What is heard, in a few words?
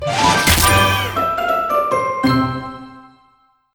Crunchy Finish